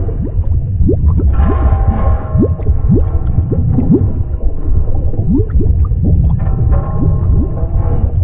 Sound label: plane sinking